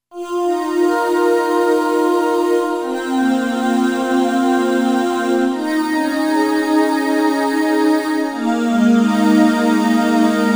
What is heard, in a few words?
Celestial Vc